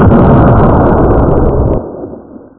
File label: tank; tanksv185